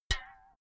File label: filled can hit hard ringing